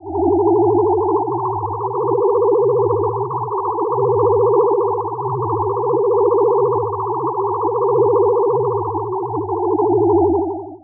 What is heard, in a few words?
Multiple ray gun blasts. Zap, Ray Gun